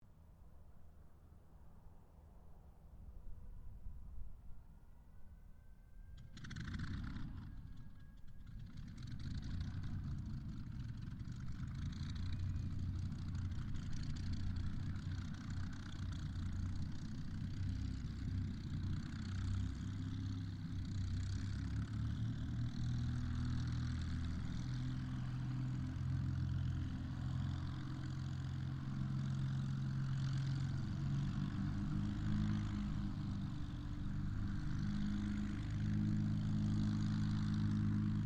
AIRCRAFT SUPERMARINE SPITFIRE MK-XVI STARTUP IDLE SIREN DISTANT STEREO; Airplanes